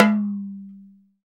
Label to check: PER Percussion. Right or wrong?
Right